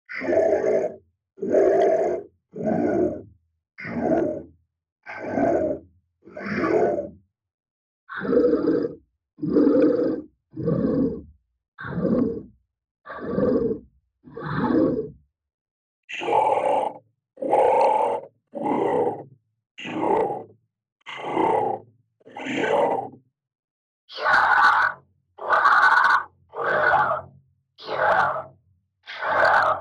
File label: monster battle sounds